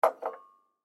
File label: Tea Cup Rack, Tea Cup Remove From Wall Rack Antique; Household; Dishware; Blastwave